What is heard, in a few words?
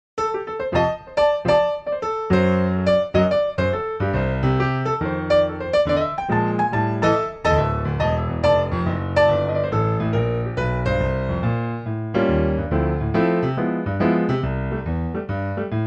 C piano; key - C - vocal range - B to D; Super shuffle piano only arrangement of the festive classic.